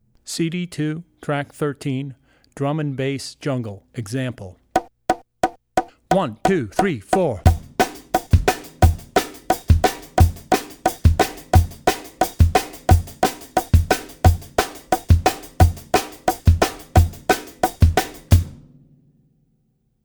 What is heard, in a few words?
Drum & Bass Jungle; CD2 Track13 Jungle - Drum & Bass; Drummer's Bible Tracks